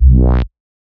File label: Misc Bass B, Psytrance; Misc & Slides